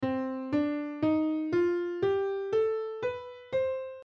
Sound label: c minor melodic scale